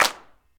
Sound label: clap sound e key; percussion; single hits one shots